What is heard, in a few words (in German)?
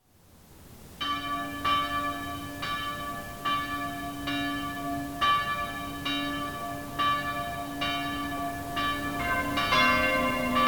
Glocken Plenum; Christuskirche